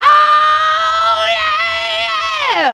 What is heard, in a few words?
"oh yeah" SUNG